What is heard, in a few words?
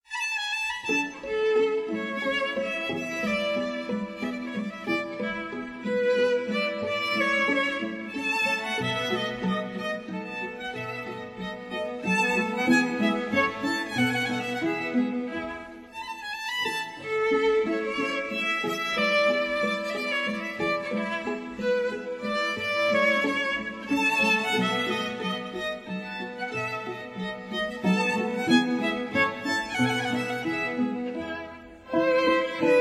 Boccherini Minuet String Quintet